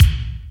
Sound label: 70s and 80s Kicks; Vengeance Samples